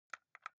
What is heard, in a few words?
console controller xbox arrow key press slow